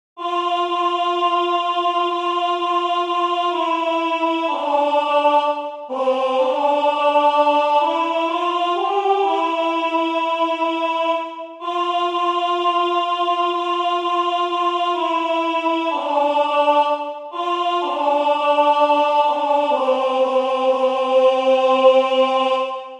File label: LAUDATE OMNES GENTES TAIZE Alto